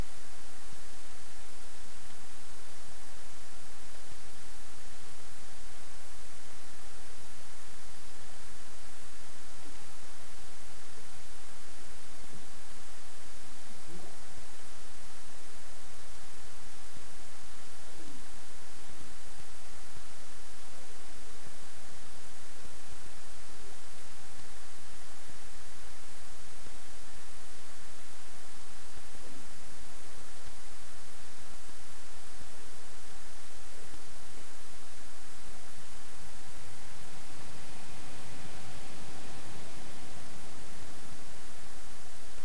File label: Rain